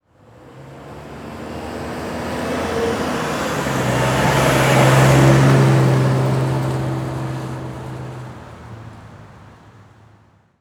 Transportation Truck Envoy Drive By Slow

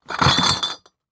Bottles Rattle Glass Empty; Foley

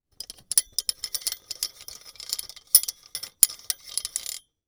Industry Chain Pull

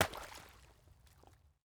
Liquid Water And Ice Impact

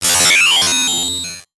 Animation Electronic; Multimedia Internet CD-Rom Flash

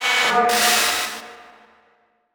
Multimedia Internet CD-Rom Flash Radio Element

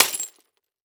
Impact Ax Smash Ceramic; Impacts

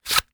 Weapon Knife Combat Knife Sliding Into Holder; AdobeCC

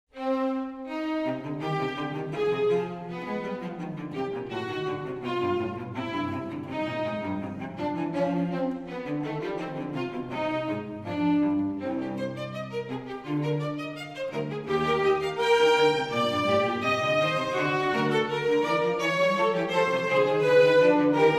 Fuga canonica in Epidiapente; J.S.BACH; The Musical Offering

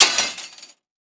Impact Glass Crash On Metal Small

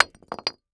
Impact Concrete Drop On Concrete

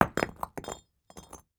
Impact Concrete Pieces Falling On Cement; Impacts

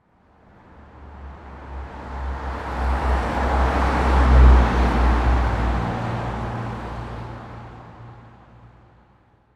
Truck Driveby Under Bridge 180D; transportation

